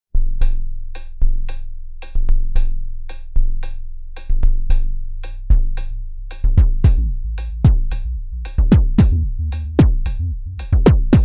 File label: Kick 3 Bass